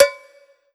Korg N1R Cowbell

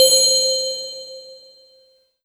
Yamaha V50 Ice Bell C5

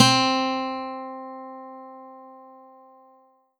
Steel String Guitar C4; Alesis Fusion

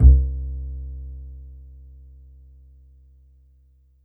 Casio CTK-611 Acoustic Bass C2

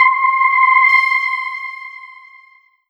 Roland SC-88 Echo FX C6

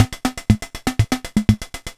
Yamaha PSS-170 Samba

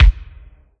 Roland D-20 Kick

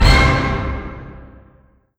Roland JV-2080 Orchestra Hit C3

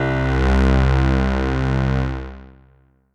Ensoniq SQ-80 Piano Strings C4

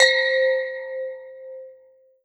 Korg 01W Gamelan C5

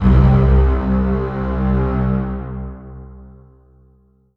Ensoniq VFX-SD Breathy Strings C4

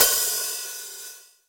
Open Hi Hat

